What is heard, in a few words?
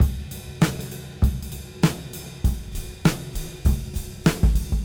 Cym Beat 99 BPM Rev; AMG - Keith LeBlanc Phat Butt Jams